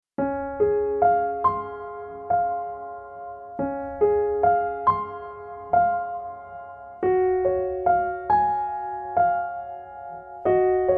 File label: piano